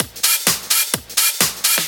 Clubhouse Loop 128 BPM

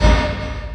XENON FX; USB Soundscan vol.24; AKAI; Industrial Loops

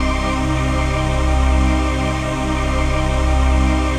Pad

